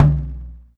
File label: Perc, Alesis DM5